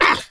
oof

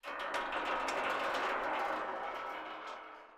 Met Crk

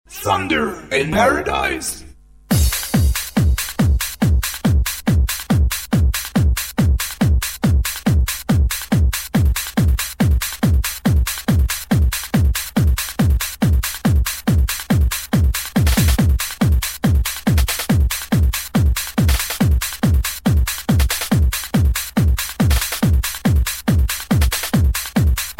Club mix

